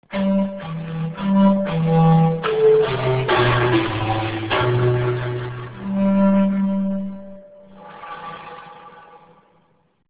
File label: zamponas alone